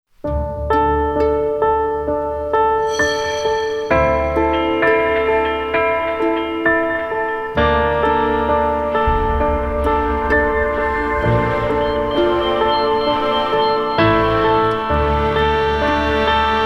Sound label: orchester